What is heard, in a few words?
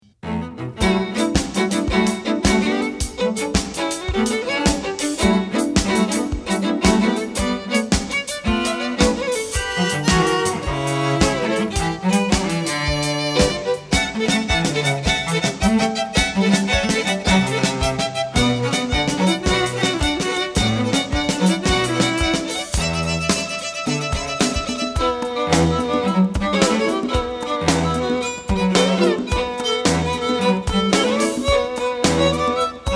String Quartet